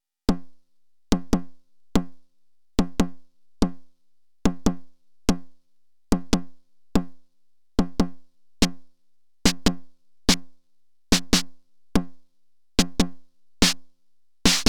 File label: SNARE Decay